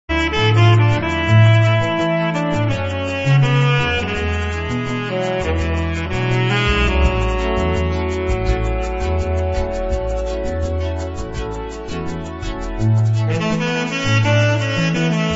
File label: Sax Solo; Wizball